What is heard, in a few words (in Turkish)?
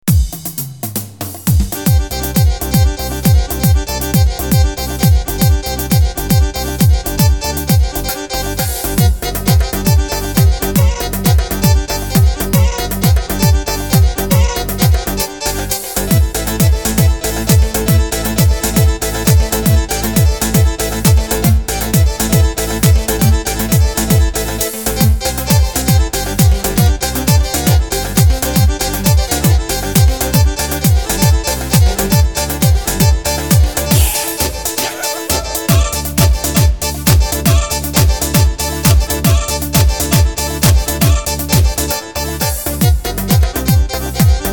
horon